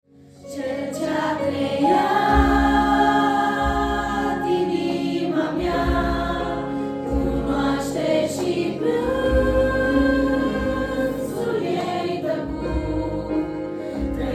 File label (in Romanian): Cor, Corul de copii; Corul REGEN; fundatia regen